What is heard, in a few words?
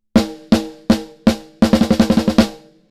snare bypass; Joe Chiccarelli